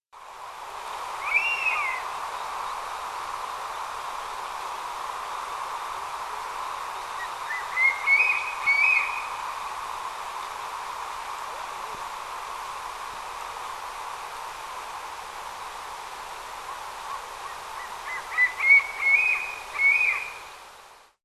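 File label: Crested Serpent Eagle; kanmuriwashi; okinawa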